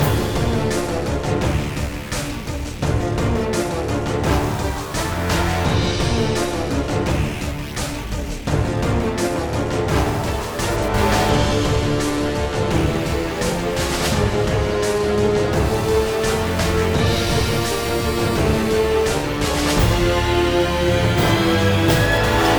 Menu Lobby Option Black Market BGM Loop; crossfire online

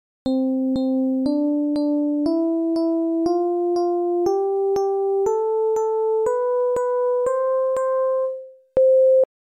Music Chip Test; badlands; arcade

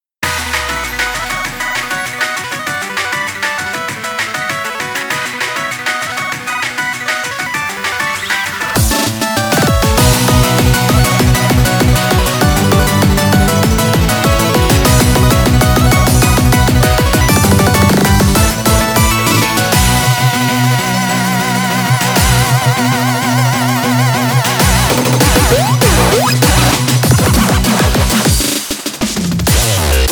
8bit; muse dash; happy otaku vol. 5; 8bit Adventurer

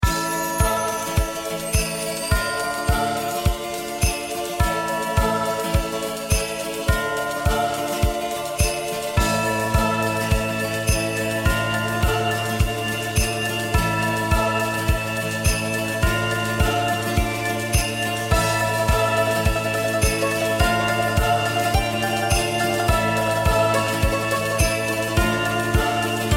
bgm zone field; zone3; goken

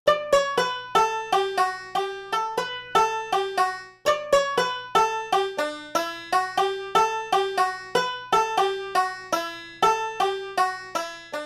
Beach - Banjo; bugsnax

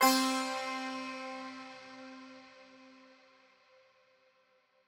Stingers pendulum win huge

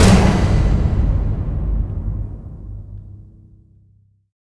Fail

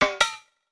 Jingle Caught Can; AR Games

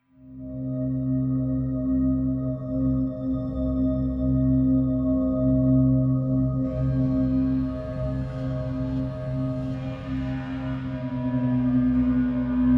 Shimmering Horror Ambience LOOP

